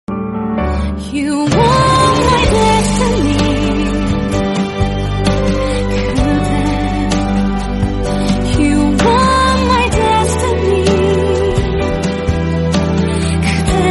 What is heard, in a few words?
romantic english